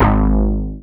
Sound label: BAS TX-802; Best Service Gigapack 2